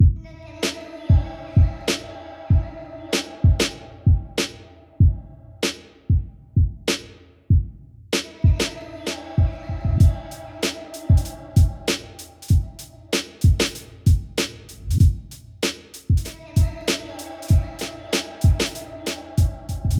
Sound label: DRUM